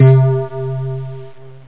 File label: Synth chime